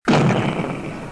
pierre pose

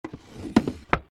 tiroir

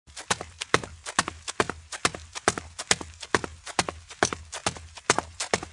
PAS; MOBICLIC145

